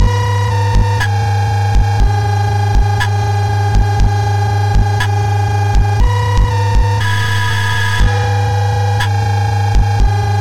Single Cycle 1K Sin Wave

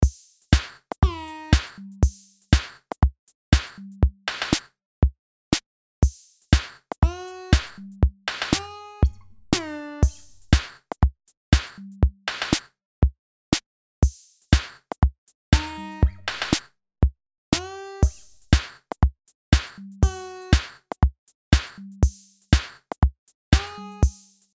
beats

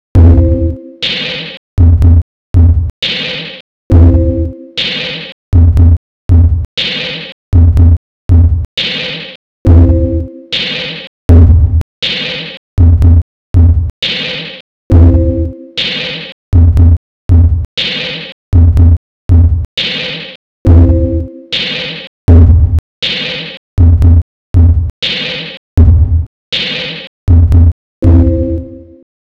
Sinewave

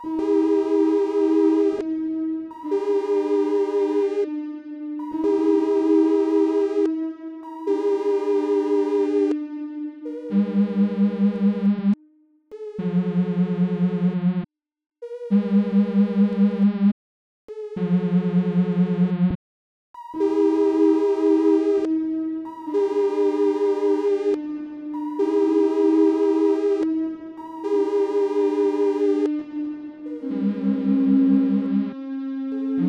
Sinewave

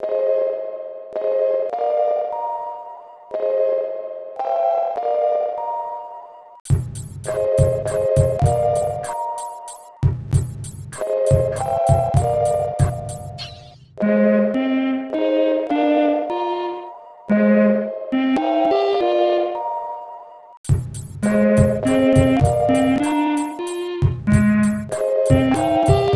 Sinewave

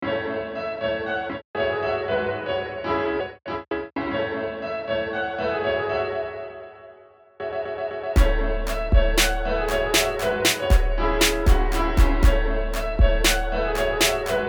Midi

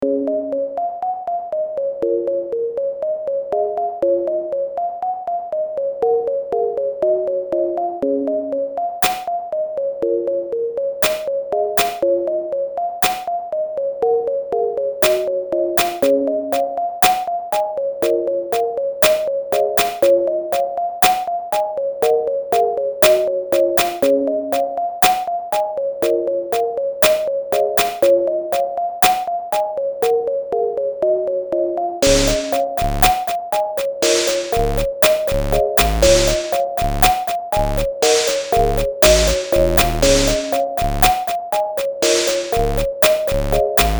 Sinewave

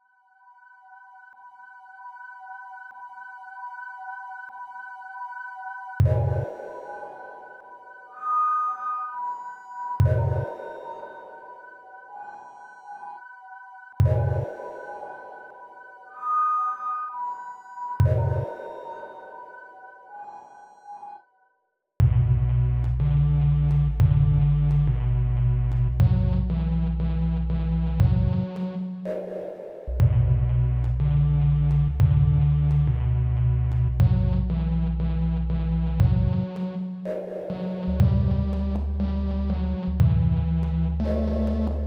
Sinewave

